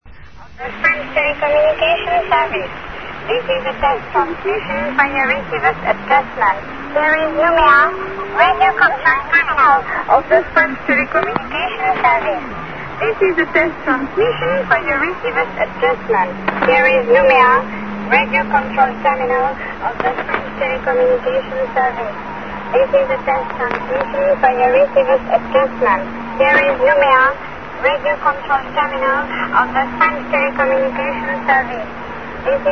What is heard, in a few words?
USB; fts noumea; rainer brannolte, d